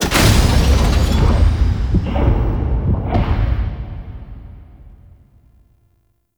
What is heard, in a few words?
Vault Heart Start; Ambience; Gameplay; Warframe; Infested Eris